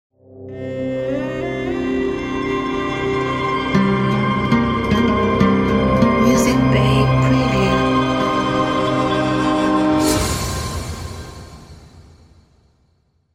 Arabic Logo

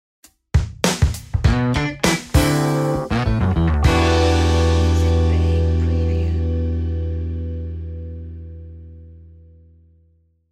Show Ident

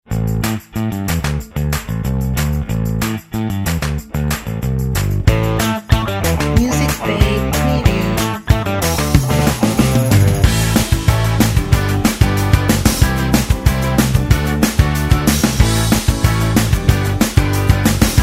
Positive Pop Rock

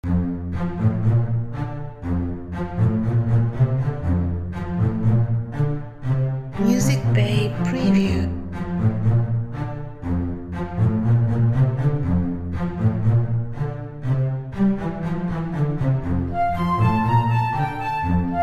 Bubbles Loop

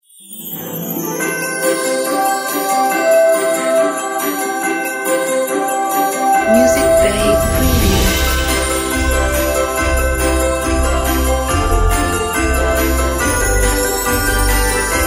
Christmas Bells

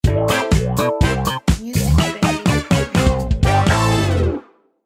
Show Ident

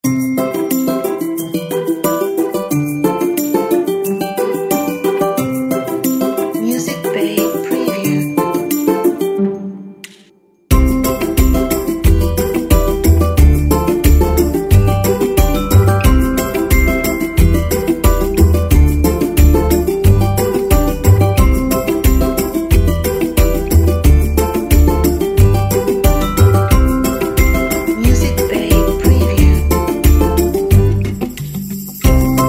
Happy Acoustic

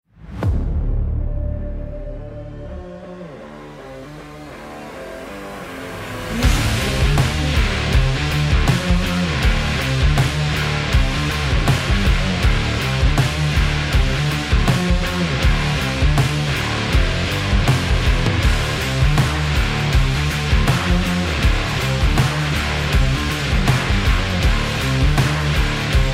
Action Sport Promo Rock Trailer

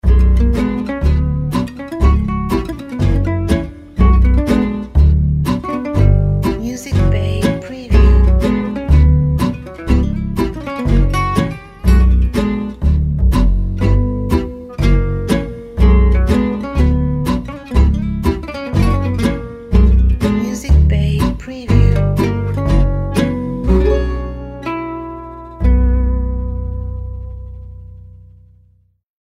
Cozy Old Cafe

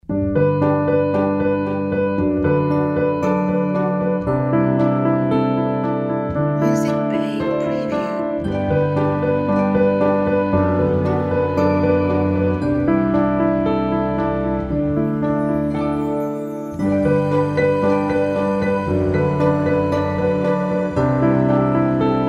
Romantic Wedding Piano

